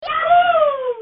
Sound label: slowed